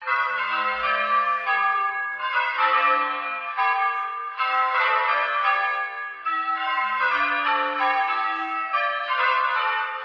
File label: bells